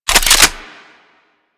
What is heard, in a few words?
Polearm Holster; Warframe; Weapons; Corpus